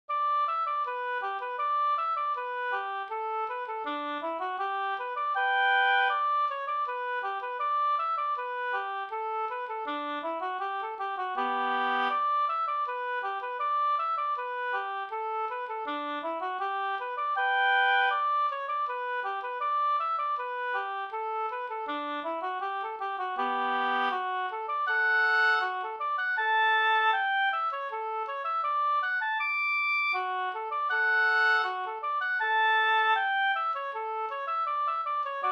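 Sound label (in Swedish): brudmarsch Kyrkmarsch fr Sodra Kinds harad Västergötland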